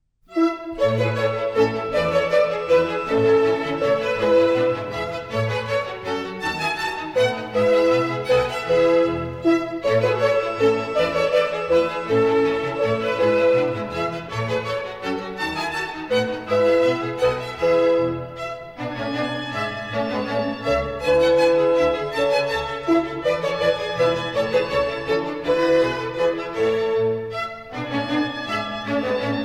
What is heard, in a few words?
Minuets, A major; Mozart; Dances and Marches